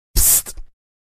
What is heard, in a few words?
screech; ROBLOX